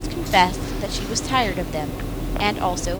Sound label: noisy